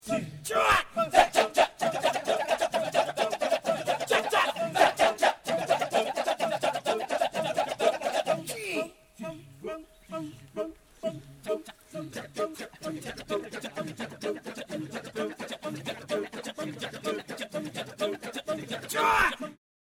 kecak

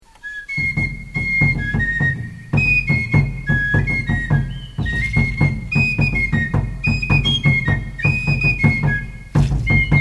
son cruzado, maya music

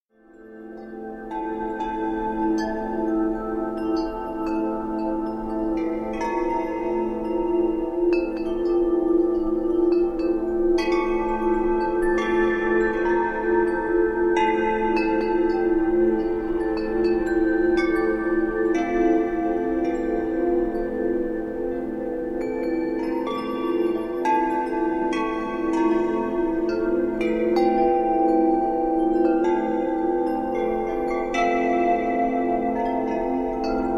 Timeless Winds, windchime

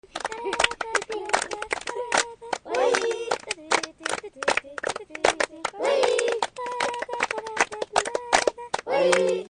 children

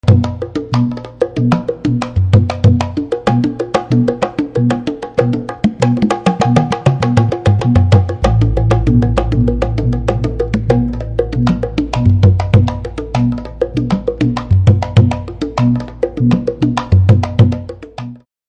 sudanese drum solo

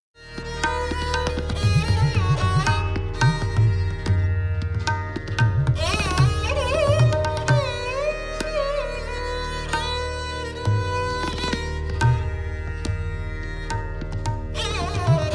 morning, sarangi

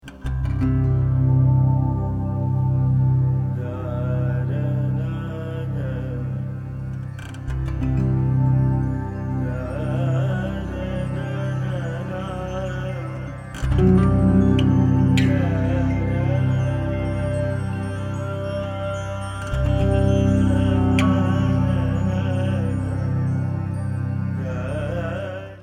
crystal, india meditation

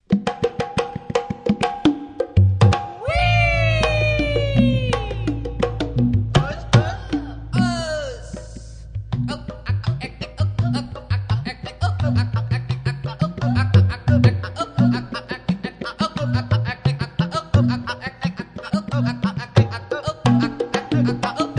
senggak drums, sunda

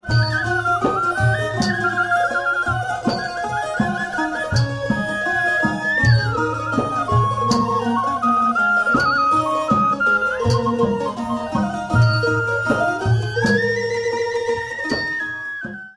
Fon Vee, thai folk music

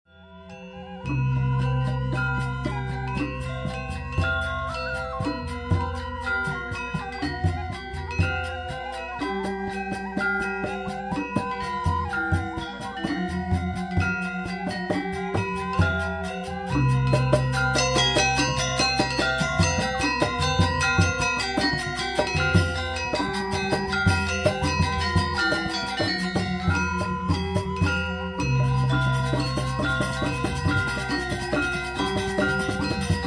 telek dance, gamelan gong kebyar

